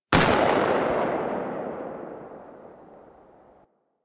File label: Shotgun Tail B; Weapons; Warframe; Tenno; Alchemist